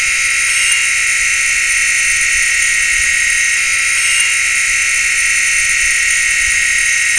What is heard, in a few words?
Grinding Sound, data recovery sounds